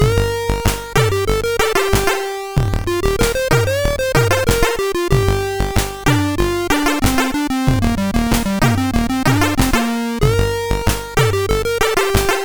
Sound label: Shotgun, CSG8580R5